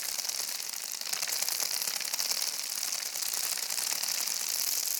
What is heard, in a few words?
Scarab fly; Legend of Keepers